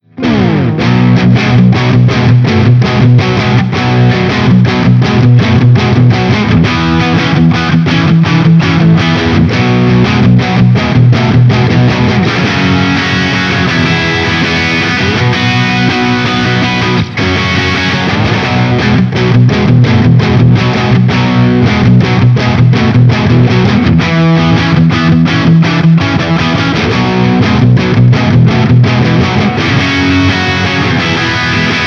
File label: blues